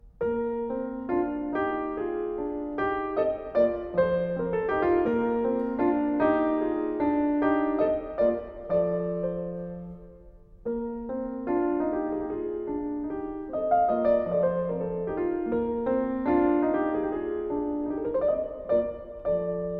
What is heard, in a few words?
B flat major, Menuet; Partita No.1; BWV 825; Menuet II